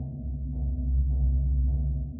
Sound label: ftl hyperlane charge ship; ambient; Stellaris